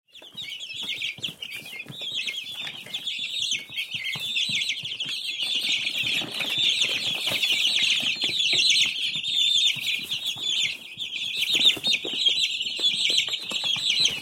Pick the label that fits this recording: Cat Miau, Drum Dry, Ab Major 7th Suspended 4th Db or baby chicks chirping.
baby chicks chirping